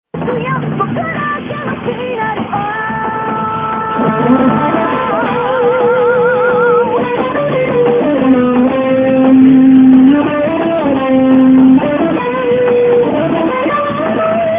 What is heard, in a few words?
guitar